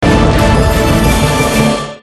transition; Dragons Kingdom

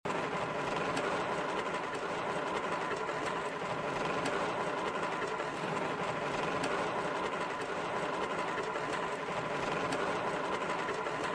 long reels spin; Magic Hot; Wazdan

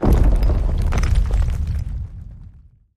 statues appear; The Golden City

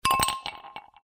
throw; Stocked Bar

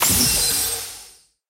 bonus symbol; Great Book Of Magic Deluxe; Wazdan

